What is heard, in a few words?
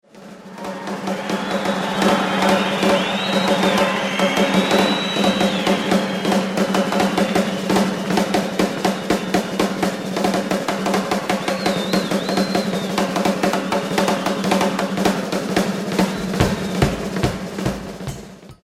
roll